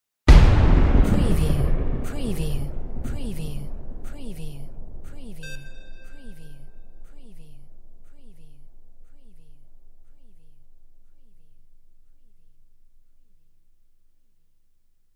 WAR CANNON; windy beach audio

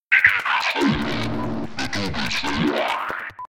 Faulty Robot

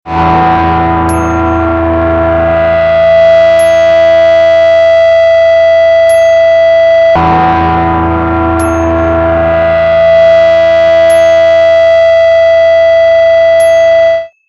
Guitar Sounding Life a Jet Feedback

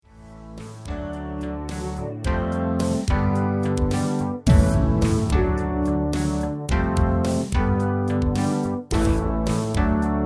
Big Beat Impact 90

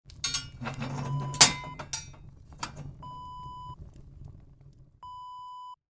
fireplace door close

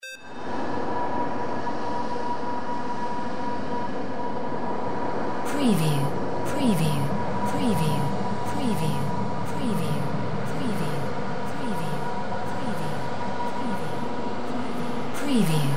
drone utopian city

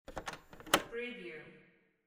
tape deck button

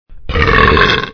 Big Belch

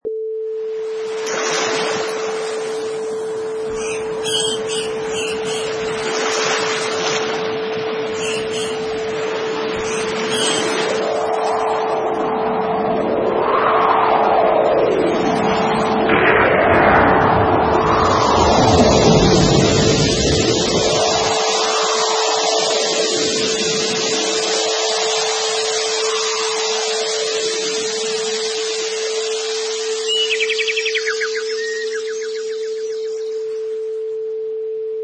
Nature Montage

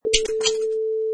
Mouse Trap

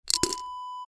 piggy bank coin drop

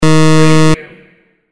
beep

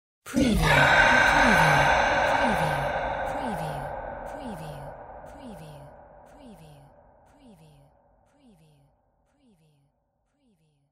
sci fi whispers spooky oorah; windy beach audio

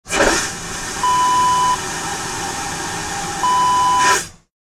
airplane toilet flush

